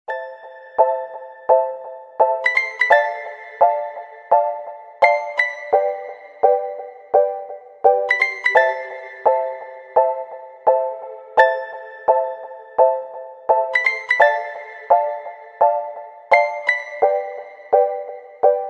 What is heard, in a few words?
russian ringtone; زنگ موبایل ساده و شیک